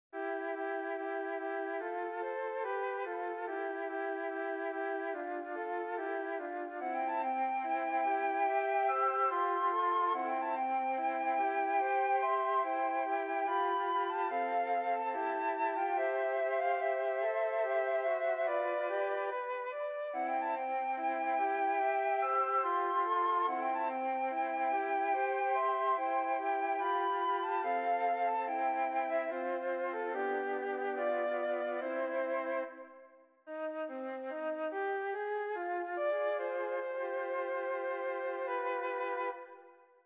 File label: Shepherd's Song 3 Flutes